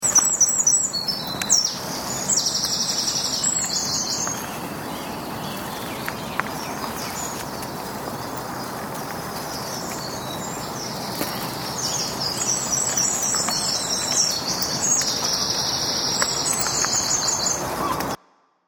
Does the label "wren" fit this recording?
Yes